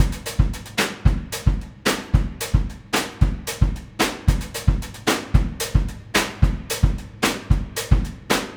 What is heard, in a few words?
112 cinematic kit hihat tribal beat